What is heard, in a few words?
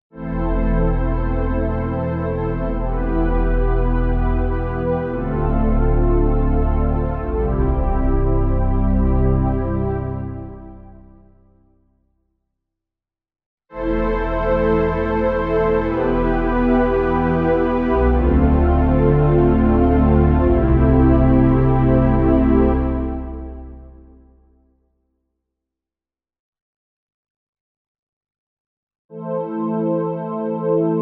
Vivo S7 Pads